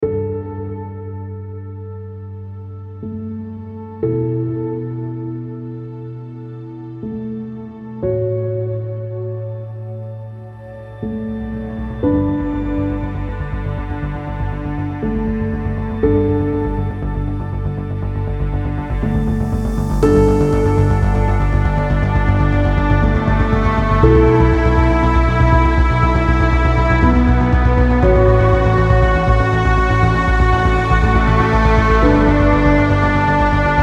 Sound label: Cinematic Inspiration